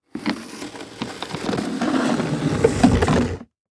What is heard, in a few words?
Impact Plastic Drag And Scrape On Cement; Impacts